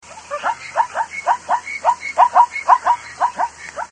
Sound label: zebra call